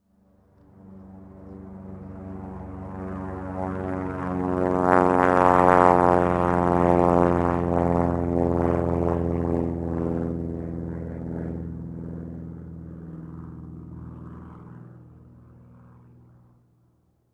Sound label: aircraft; ambient; World of Tanks Blitz